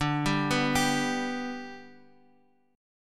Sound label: D 6th Suspended 4th D, chord; D6sus4 arpeggiated; JGuitar